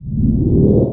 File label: skill charge; SkillSound